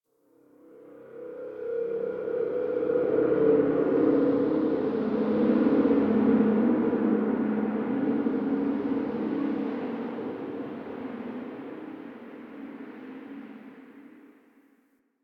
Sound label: Dark Wind; Warframe; Ambience; Nineteen Ninety Nine